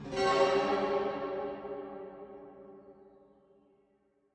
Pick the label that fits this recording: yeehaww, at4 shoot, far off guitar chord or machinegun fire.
far off guitar chord